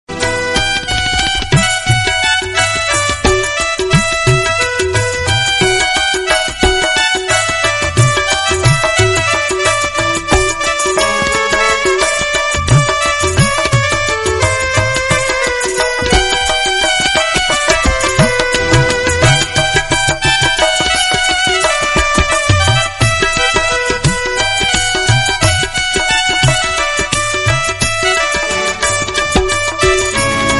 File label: heri sakhi mangal gao ri, instrumental